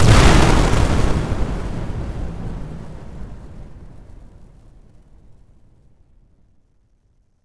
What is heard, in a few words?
Explosion bomb blast ambient